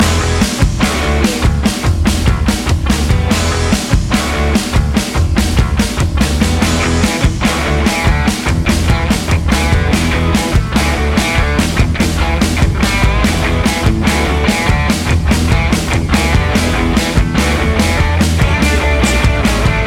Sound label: rock commercial loop